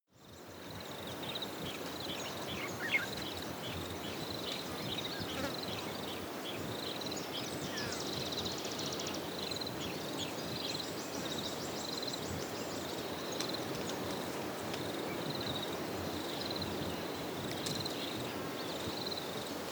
african bush birds stream

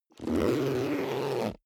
zipper backpack close

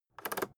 rotary control full turn fast

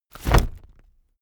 umbrella telescopic put up fast

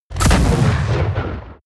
ballista fire

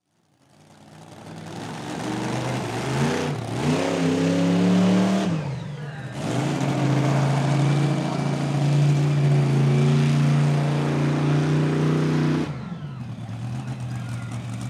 monster truck race

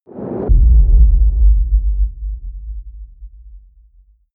low atomic

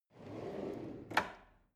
drawer cutlery draw out

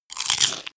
crunch bright short

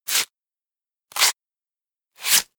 scratch

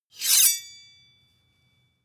sword blade grind swish

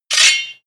sword pull sword knife out

